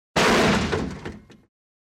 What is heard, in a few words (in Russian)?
udar pri stolknovenii